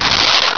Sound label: CLAW; French; Doom ''Univers Paralleles'' (Sybex); DOOM2